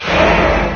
train start; video game; source engine; hl1

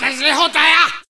noisemaker, samurai; source engine; tf

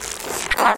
bugbait squeeze; Source Engine; video game; weapons

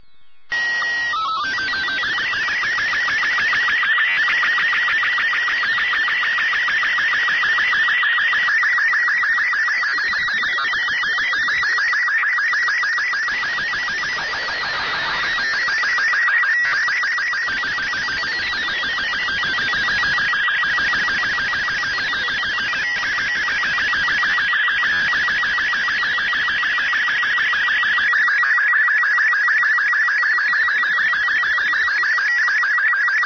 ambient; Portal 2; Source Engine; video games

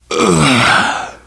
death scream, biker, survivor voice; source engine; left 4 dead 2

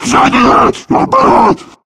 alert, voice; video game; source engine; left 4 dead 2; charger

